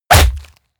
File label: stylized fist punch